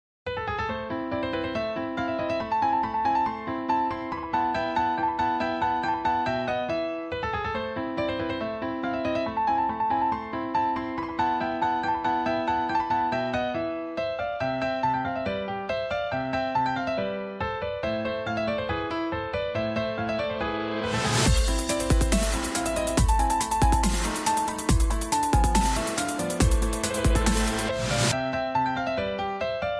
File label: Trap